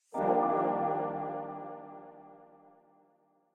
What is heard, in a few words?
launch; retroarch; retro-assets; buildroot